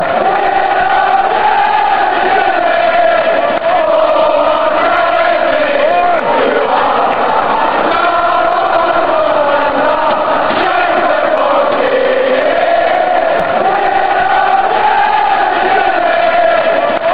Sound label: chants; jimmy jefferies